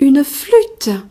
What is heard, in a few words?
flute; gcompris-data